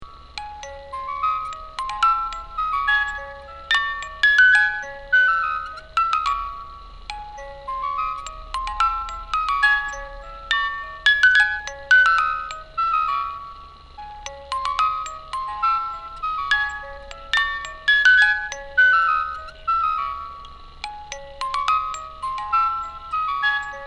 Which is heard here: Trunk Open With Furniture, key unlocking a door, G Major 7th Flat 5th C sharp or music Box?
music Box